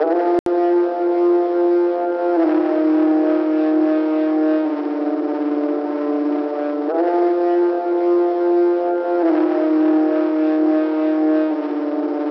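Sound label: vocal chop, 140 bpm, Fm; Demons; Slate Digital Omnivox Vocal Sample Pack; Chops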